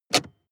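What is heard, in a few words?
sports car interior handbrake pull very short; verkehr-transport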